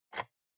PC Mouse Scroll Fast

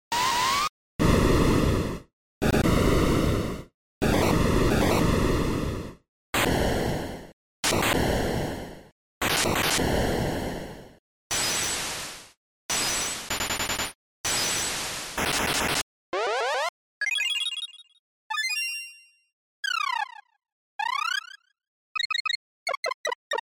SNES RPG

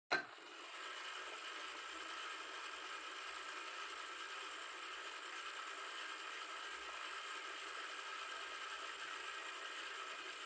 Pendulum Clock Chime Drive

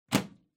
Power Strip Adaptor Floor Hard Plug In

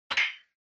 Retro Anime Switch High

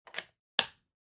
Portable CD Battery Cover Close

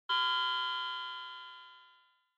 Wall Clock Time Signal

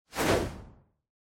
Camera Motion Dry; シーン・カメラモーション

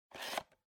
Floppy Disk Drive Insert

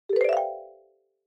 Xylophone Fast Short Up

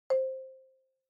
Xylophone, Single

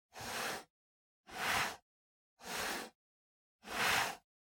PC Mouse Move Hard Pad Mid

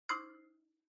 OS Sound (Speaker-Notification)

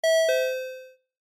Quiz Correct Answer

